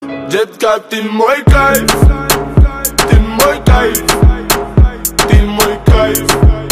muzyka dlya donata

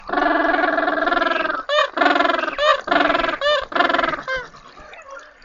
pingvin

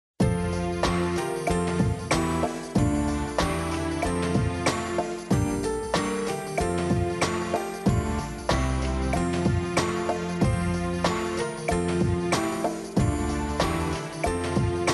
rojdestvenmuz

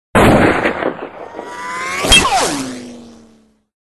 zvuk iz ruzhya i popadanie

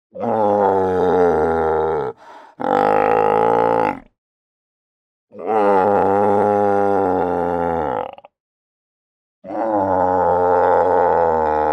morskoj lev

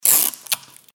otkleiv korotk kuska scotcha

